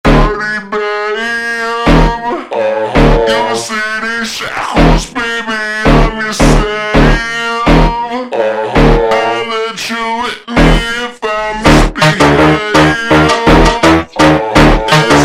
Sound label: ultra Slowed